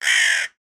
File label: corvus corone cry